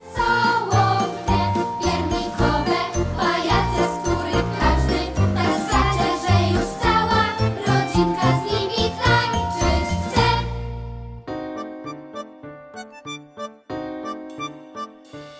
wersja wokalna

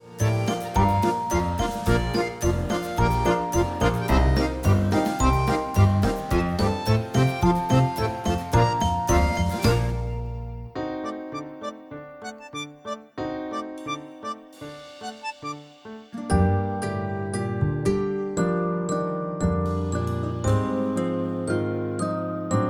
wersja instr

